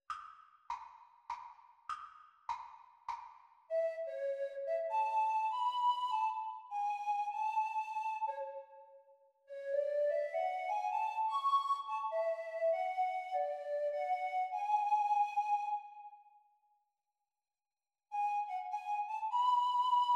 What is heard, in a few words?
Descant Recorder Practise speed; Grade 6